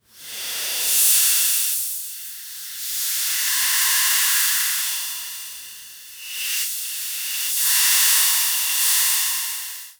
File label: asmr head spa wire massag